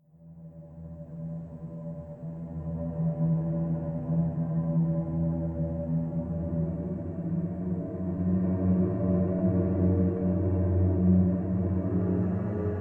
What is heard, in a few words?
Slow Stinger